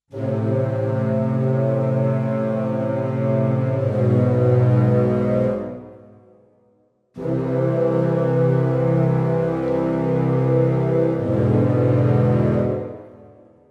woodwind ensemble

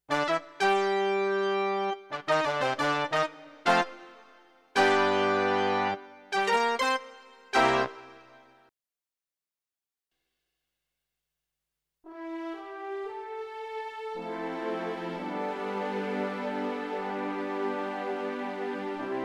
CP4 Stage Brass

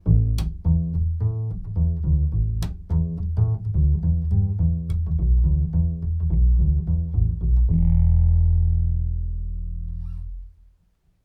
upright bass; tbone Ovid CC100

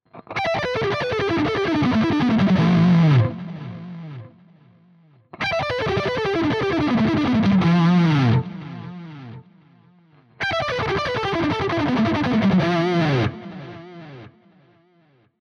JA 60 all positions Lead Run

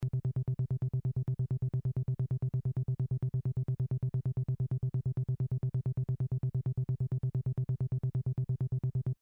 RE-303 Filter Test; Din Sync

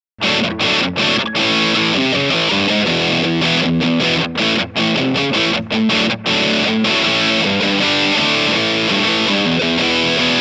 gunslinger steg hb gain ful

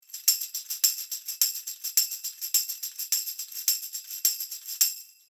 Beyer M88 Tambourin 1 Meter

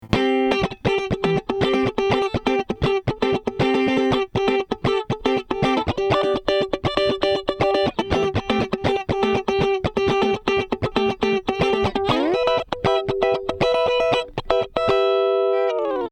Guit wet; RND Portico 5043

